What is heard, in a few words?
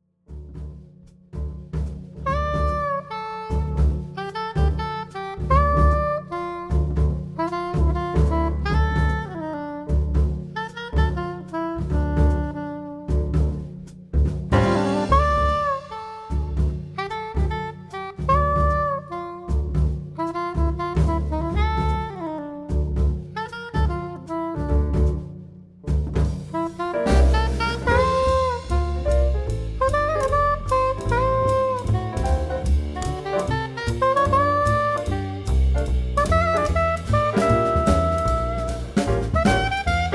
quartet